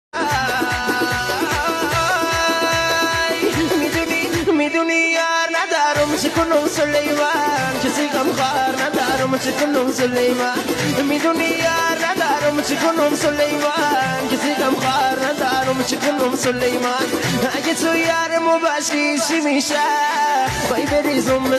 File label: Remix